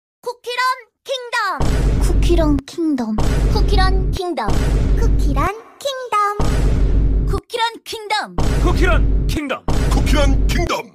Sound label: vine boom